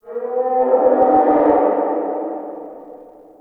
Interior Distant Creature Groan; Ambience; Indoor; Warframe; Infested Eris

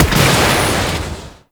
Fan Explosion; Warframe; Corpus Gas City; Gameplay Remaster; Ambience

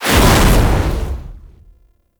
Demonium Summon; Warframe; Uriel

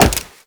Wall Jump Metal; Warframe; Tenno; Wall Run

